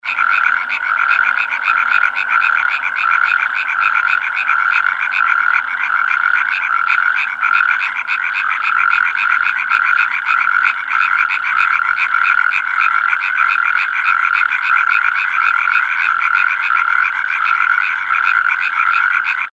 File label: Bufo calamita Konzert